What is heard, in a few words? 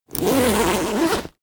zipper open close